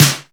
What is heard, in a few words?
snares; Vengeance Samples